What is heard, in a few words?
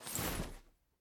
armor long; smite; hera